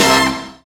HIT Band, Big Band Hits

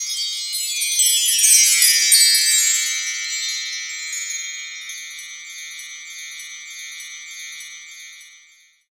Wind Chimes Down; Zero-G Jungle Warfare 1, 2 & 3; Strings & Things

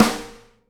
SNARE, Live Snares

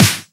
Snares; Vengeance - Essential Clubsounds Vol.4

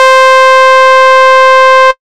SY SMOOTH; ModelCycles

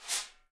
Piccolo Brush Fast; Digital Sound Factory

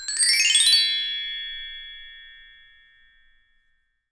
TREE BELLS, Percussion; Voice Crystal - Steve Reid Definitive Percussion

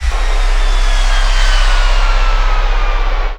sfx sci fi; Masterbits - Switch FX

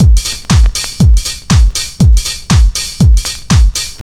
house loop

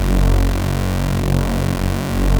OB F#2, Rare Synthesizer; Hollywood Edge - Giorgio Moroder Rare Synthesizer Collection; OB 4 VOX

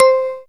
synth c, Top End Tinklers; Dance Synths

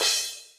Akai XR-20, Drum Machines, Cymbals

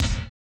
Sharp bass, Percussion; Giga Samples Collection; EMUSP12

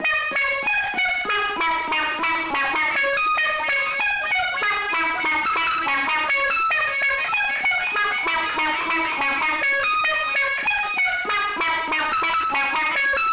MELODY

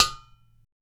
UDU, African

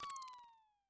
DOPPLER; Yamaha DTXpress-II

